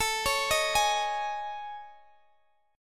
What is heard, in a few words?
A Major 7th Flat 5th, Guitar, Open G